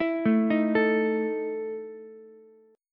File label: E Major 7th Suspended 4th, Ukulele; arpeggiated; JGuitar